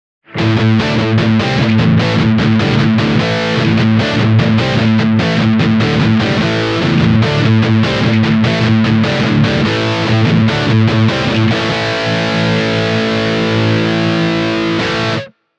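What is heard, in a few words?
blackstar ht metal 5 overdrive british direct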